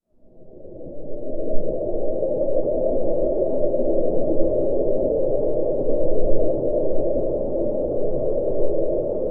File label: meteorite flying in the o, asteroid